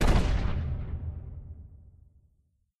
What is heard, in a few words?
Meteor Hit; doodle jump 2; android